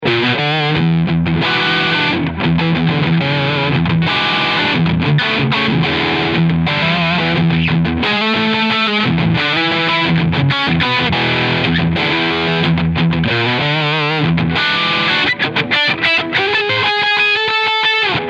PRS NF 53 Overdrive Steg Pickup; Blue Matteo

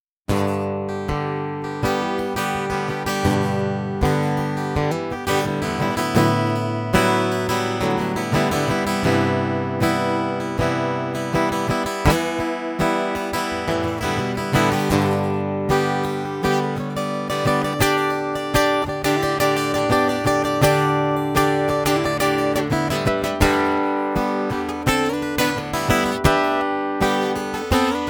Gitarre Flat EQ

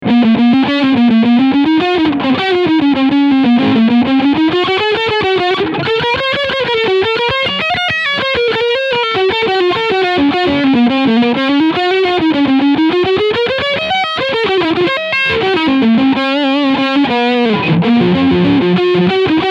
Extreme 76 BK Lead Sound Steg Humbucker; Harley Benton

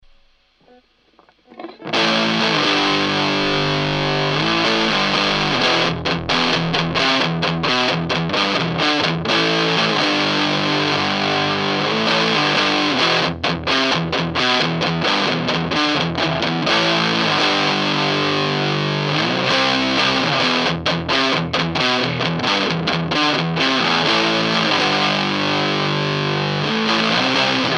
Hals Pick Up Gain; Iron Cross Signature

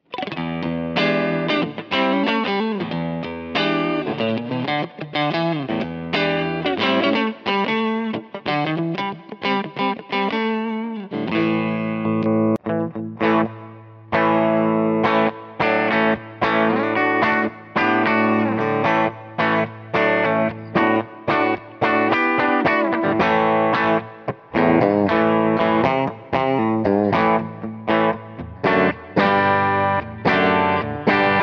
PlexiRanger Boost on Mittelstellung; Carl Martin

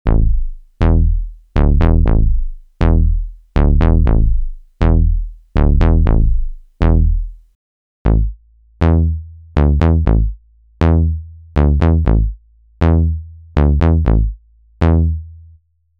Novation Summit Wavetable Bass Vergleich

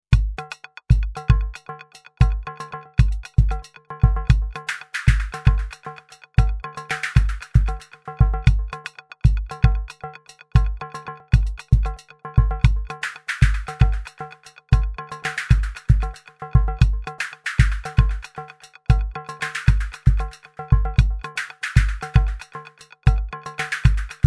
ND2 Delay Effekt

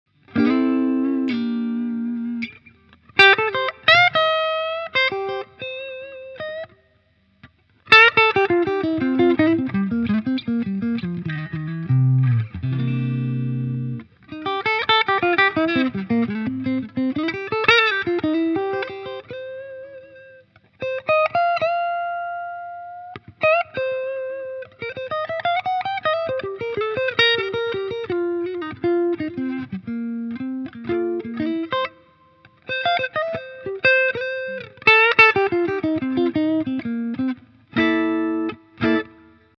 Yamaha Revstar RSS02T Hals clean

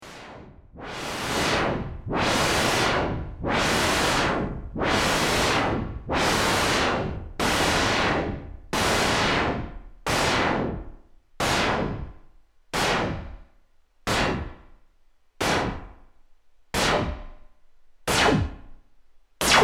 Rauschen mit Modulationen

